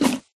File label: eat, llama; Minecraft